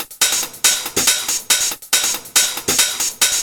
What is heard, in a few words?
Drums; Techno Music Maker